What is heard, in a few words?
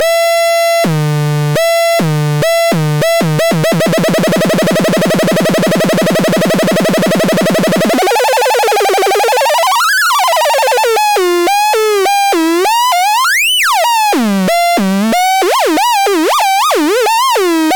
osc fun